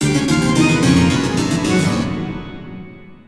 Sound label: Level Failed; luigis mansion dark moon; 3ds switch; Old Clockworks